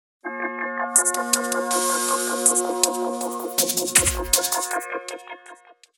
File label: technical pulse